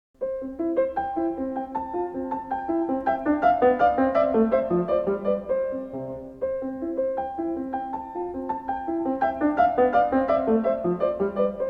variacion 5; tema con variaciones

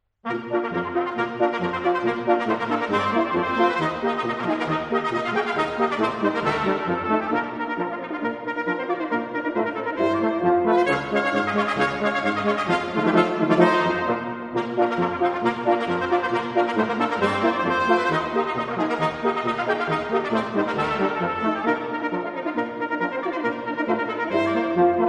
Quinteto de metales; Agrupaciones de camara; Agrupaciones de viento metal

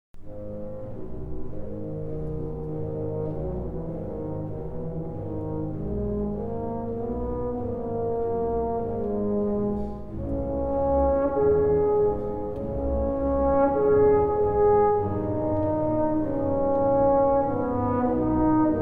Sonido de bombardino; Viento-metal

